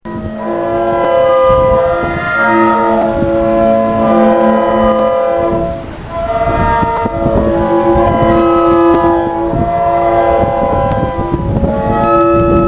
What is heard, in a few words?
High Tide Organ